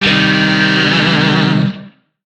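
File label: Shawzin Pentatonic Minor Chord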